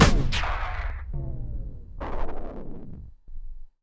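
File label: deep house FX, 125 bpm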